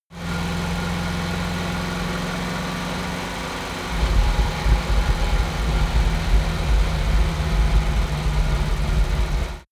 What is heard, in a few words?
car dacia driving long decelerate